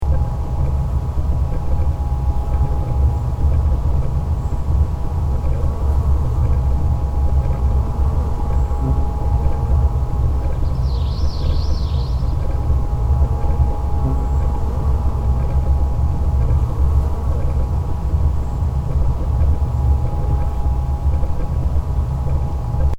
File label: flies copper water